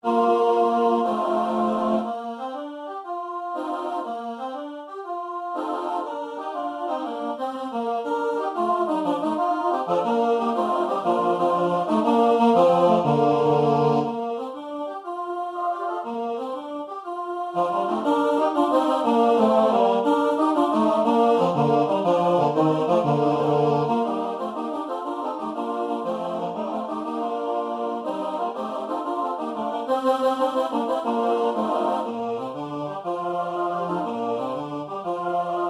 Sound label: alto